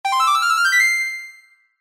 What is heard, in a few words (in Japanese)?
決定